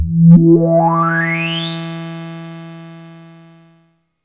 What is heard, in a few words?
JUNO 60 E4